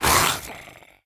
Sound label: mimic death; Crypt of the NecroDancer